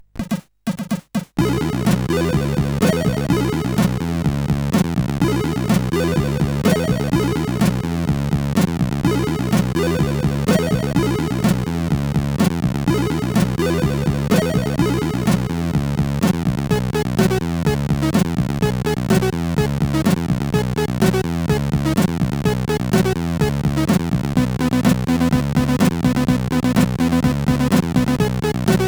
Music, CSG8580R5

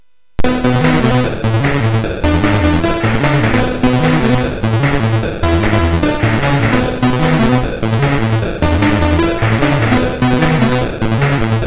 Synth Sample, MOS6581R3; hvsc

